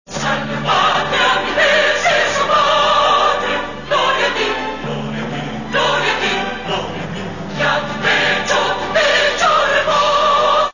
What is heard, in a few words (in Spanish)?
Himno; Ecuador